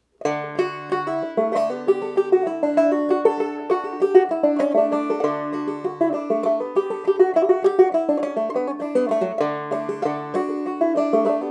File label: Banjo D; Rocky Mountain Goat